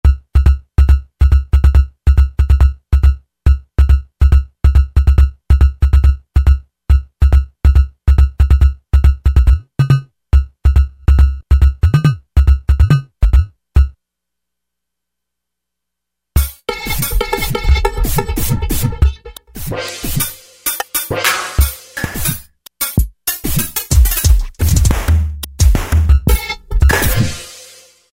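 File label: Random Noise KIT, Roland V-Synth; Factory Patches; 485 RandmNoizKIT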